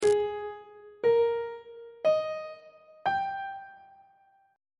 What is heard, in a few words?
g sharp major 7th suspended 2nd chord intervals on treble clef